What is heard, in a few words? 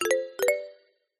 multimedia game sound mallet positive advance